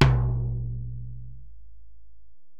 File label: Tom, Alesis DM-PRO, Drum Machines, Toms